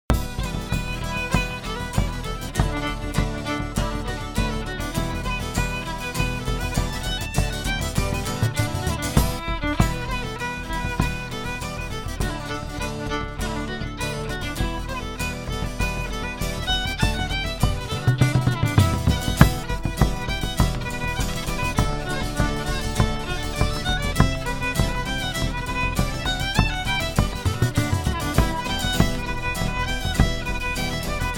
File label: reels